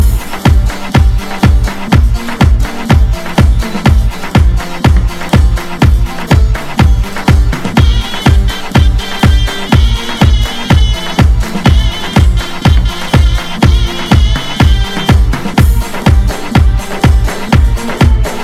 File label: Oriental Melody